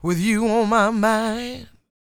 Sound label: SING W, Vocal Planet Blues & Soul, BLUESY; ILIO